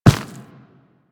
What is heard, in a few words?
Run Dirt, Footsteps; Warframe; Operator